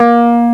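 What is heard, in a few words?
WURLITZER, ELECTKBD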